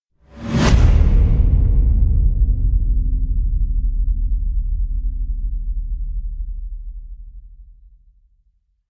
booms forest with whoosh

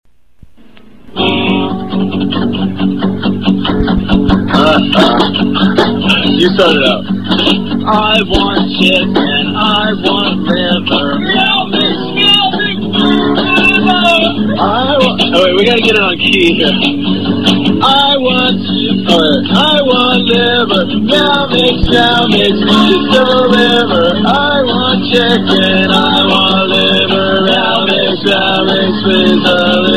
acoustic live

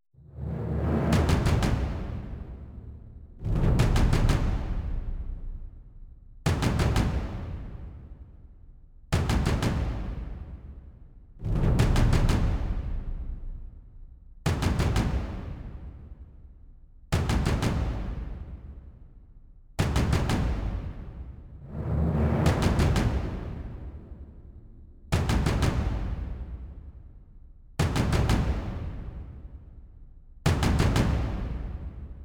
percussion only

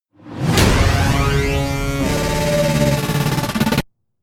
tonal impacts code break with whoosh

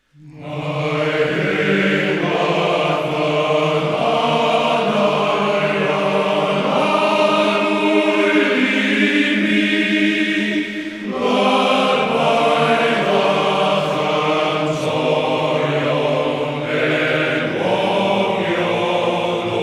male voice choir

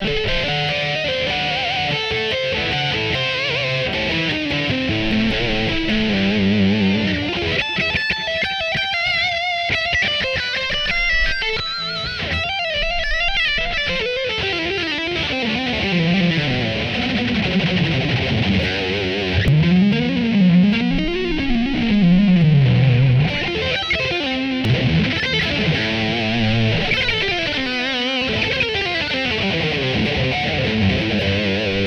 guitar stroke

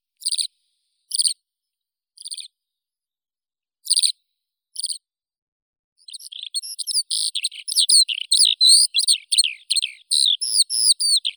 zhavoronok polevoi alauda arvensis; zvuki prirodi

